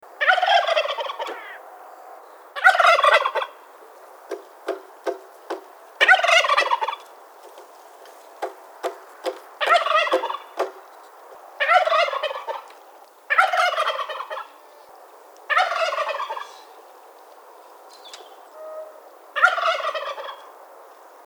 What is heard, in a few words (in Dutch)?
kalkoen; ringtone